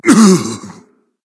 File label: grunt hit berserk; cuchulainn